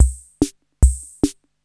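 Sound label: MARCH, drum machine; Crypton - DrumBase5000; TR-66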